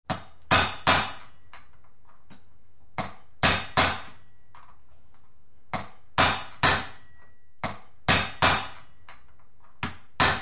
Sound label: Hammer On Metal; hammers